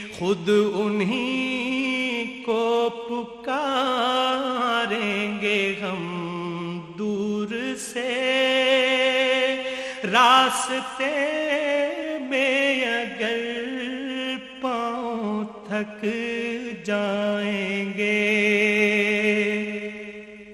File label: Islamic Ringtones